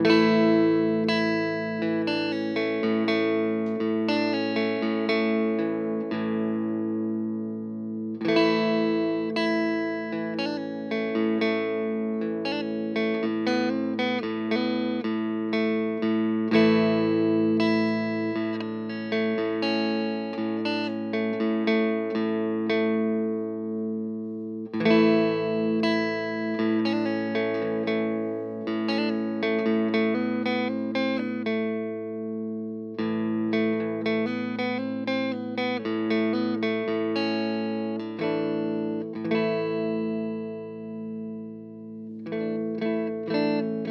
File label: ESP LTD Deluxe MH-1000 Clean Split